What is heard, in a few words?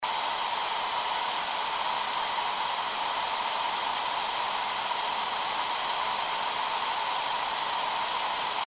dryer